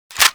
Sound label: Latron Clip In; Warframe